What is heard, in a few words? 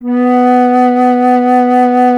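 flute, alto flute; Miroslav Vitous; Solo Instruments VOL-2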